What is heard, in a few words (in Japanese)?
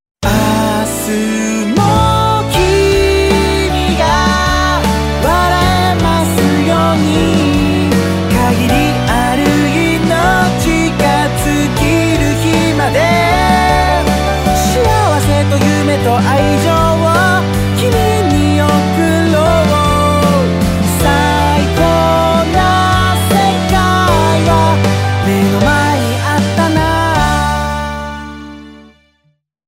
男性楽曲サンプル